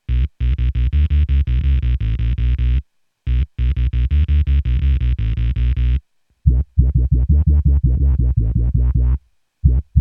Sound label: wahoo gated fuzz envelope, bass